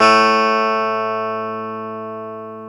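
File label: E 12 String guitar; Club-50 - Foundations Roland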